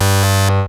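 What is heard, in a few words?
sample and hold G1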